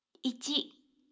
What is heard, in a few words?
office; CENSREC-4